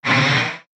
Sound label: refill; TerranQuest